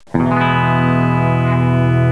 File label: e minor, scales